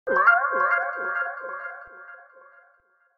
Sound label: confused sound effect